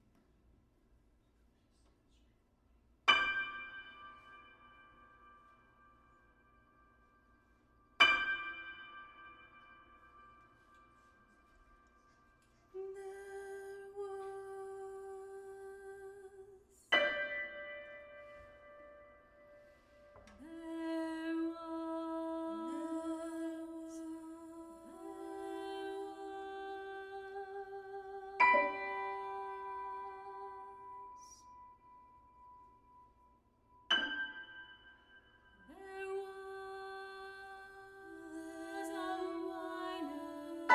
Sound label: house concert